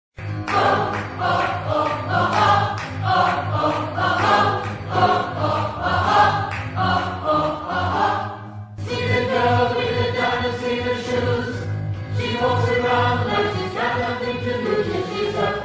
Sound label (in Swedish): bas